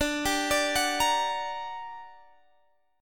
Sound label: G Minor Major 7th, D, guitar chord